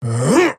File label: attack effort small; dota; axe